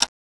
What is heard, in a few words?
clock; quake2